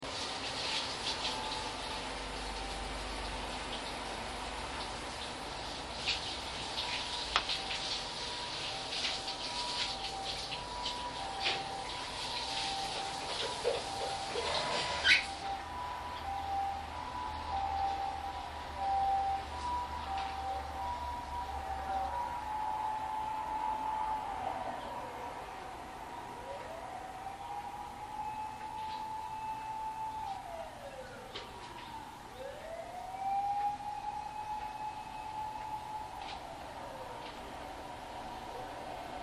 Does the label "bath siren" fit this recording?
Yes